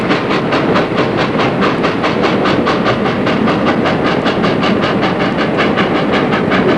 PASSING, treni; trasporti

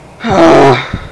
FRUSTRT, gemiti esclamazioni mugolii; persone

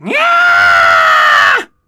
SCREAM; urla

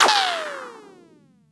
Twang, cartoons

